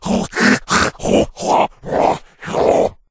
burning

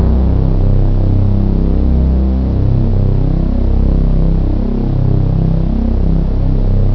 wind

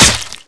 knife stab

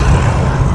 pain; nemesis

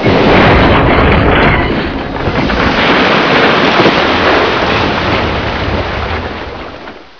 bridge crush

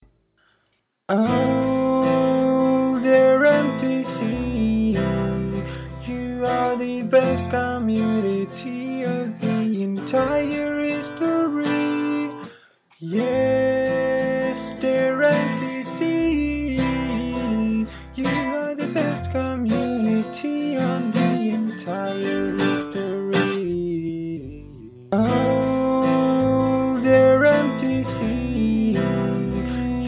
song

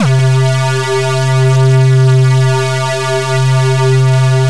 sfpistol shoot, weapons

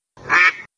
Quack; duckslides

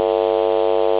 loop puls; moon

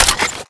clip out, weapons

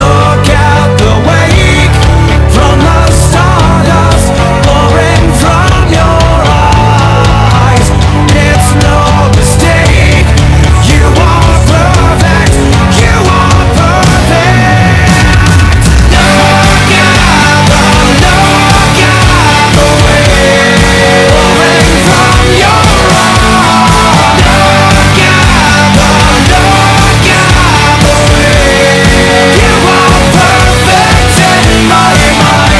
song

